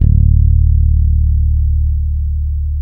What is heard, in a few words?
6-STR FUNK, bass; Spectrasonics; Bass Legends